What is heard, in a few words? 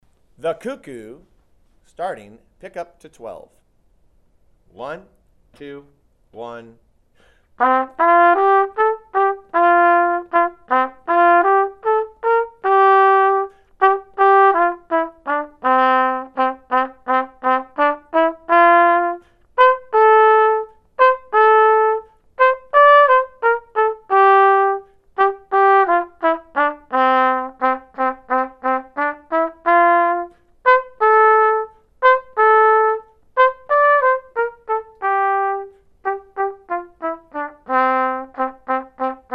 flute